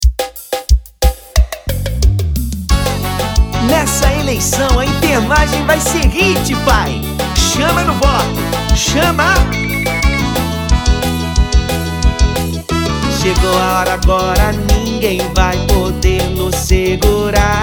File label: jingle pisadinha; voto enfermagem